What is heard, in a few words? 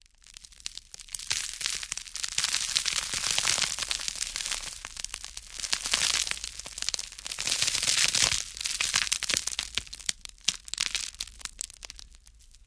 Light Debris Falling